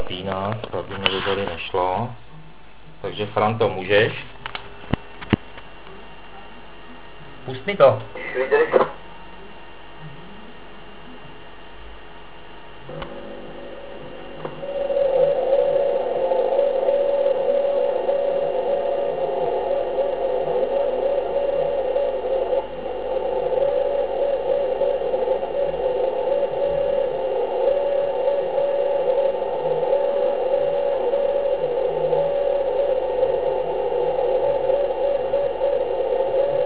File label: 50 microwatts, beacon